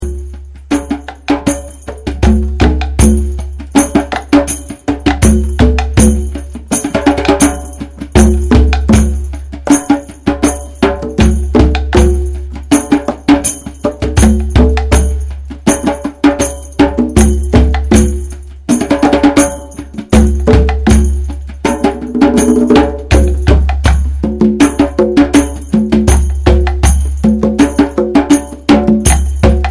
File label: 3 beats in 4